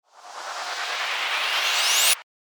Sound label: RISER